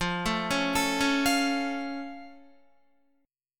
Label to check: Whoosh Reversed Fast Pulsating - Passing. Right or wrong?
Wrong